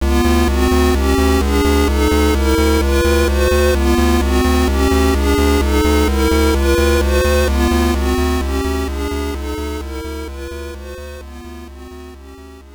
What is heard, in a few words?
Boss Approaching